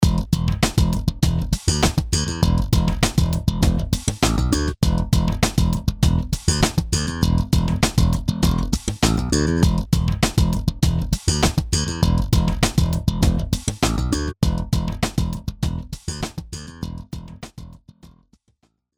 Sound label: Virtual Bassist Phrase Slap Bass